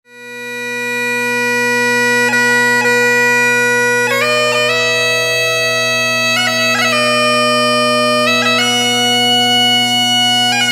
She Moved Through The Fair bagpipes; Wedding bagpipe music

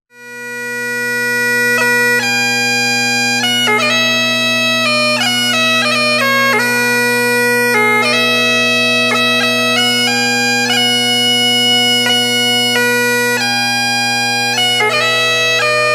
The Rose Of Allendale bagpipes; Wedding bagpipe music

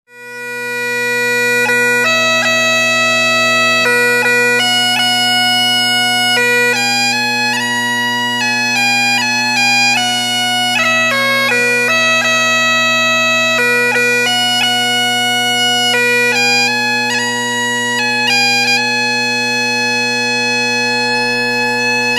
on bagpipes; Wedding bagpipe music

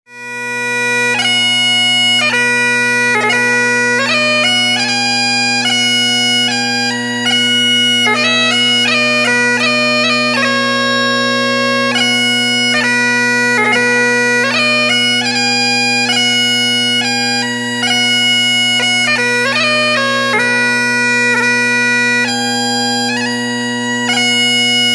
bagpipes; Wedding bagpipe music

